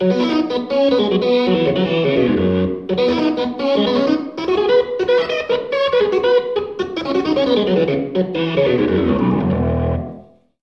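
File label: Overdrive, rotary amplifier speaker; B3 Cool Overdrive; MOON Amp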